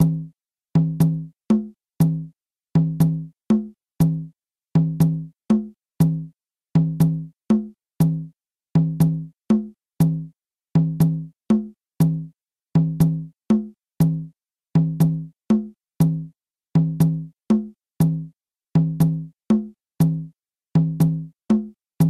yambu matanzas tumba

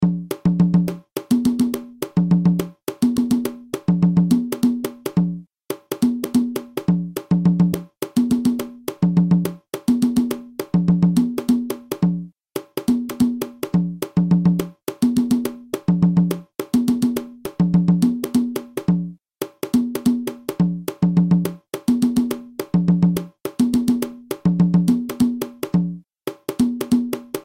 guarapachangueo cajon

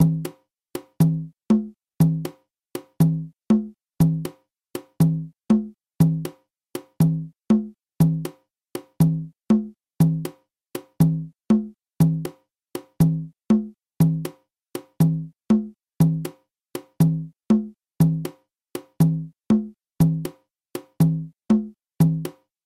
yambu habana tumba

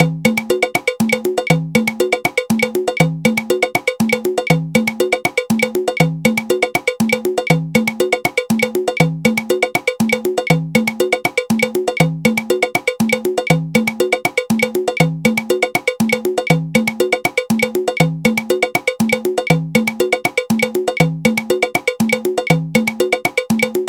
palo

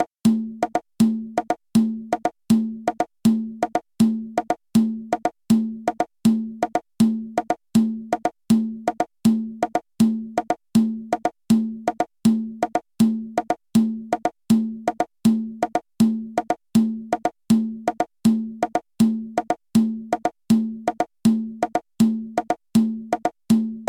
palo mula

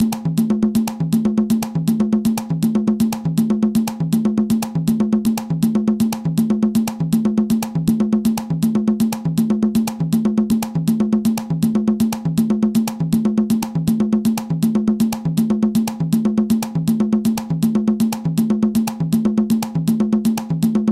columbia habana tumba

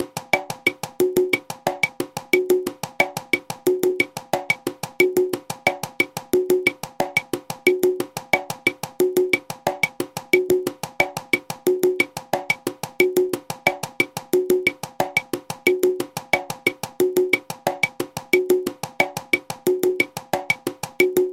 son montuno conga clave

